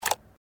Closing Opening log

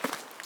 Grass footstep

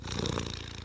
Purr

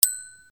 plim

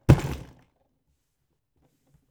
Box

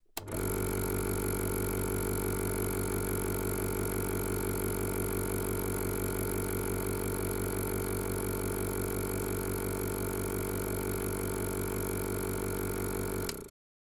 working machine

